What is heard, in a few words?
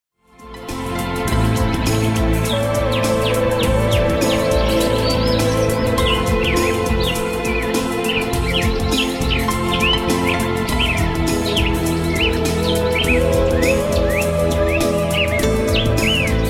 Nature At Night